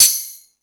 Hip Hop, Drum Machines

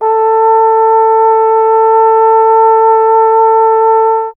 Trombone A; Miroslav Vitous; Solo Instruments VOL-1

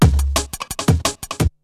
BREAKBEATS, TECHNO

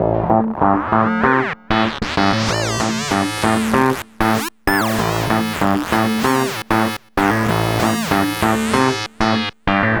E-Bass + Analog Synth; Sonic Foundry (Sony Creative Software); Leo Cavallo Bass Taster

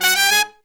Pop & Funk Brass, 096; Best Service ProSamples vol.25; AKAI

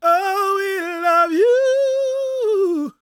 GOSP MALE, Vocal Gospel; Vocal Planet Vol1 Gospel

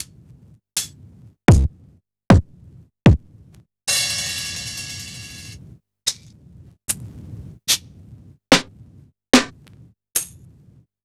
Vinyl, Kit

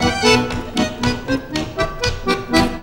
ACCORDEON

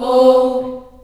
C3 CHILD OHS, Choir

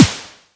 Sn fat, drum machines

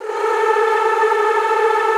A3-R, Extended Classical Choir; Best Service

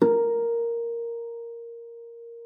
FLAG A4, harp; Xsample VOL-8; HARP+FX

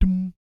BS DMM, Vocal Planet Jazz & FX; ILIO; BASS DUMMS

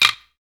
Sticks; SB Sticks

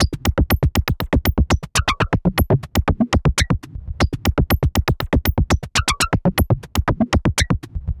Anabeat Mix, Beats

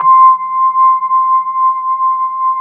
Fender C5; USB Soundscan vol.09; AKAI; Keyboards Old School

